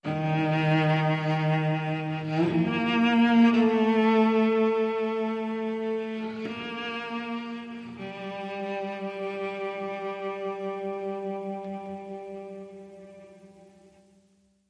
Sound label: Cello